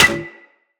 Melee Staff Impact Generic

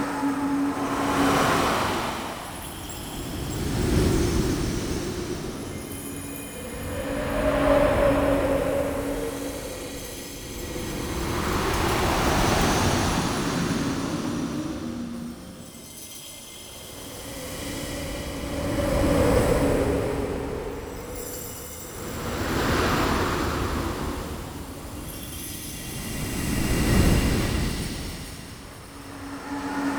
Ambient Bed Loop; Warframe; Warframe Intro Choice

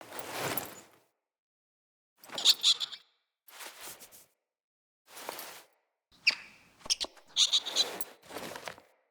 Interact Pet Squirrel; Foley

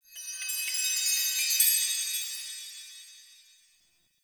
Chimes; Warframe; The New War; Narmer Outpost

